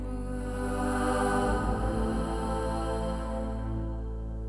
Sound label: test signal